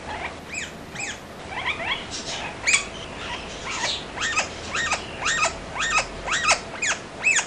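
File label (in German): lauteres plappern, Katharinasittiche, Lautäußerungen; pfeifende oder zischende Laute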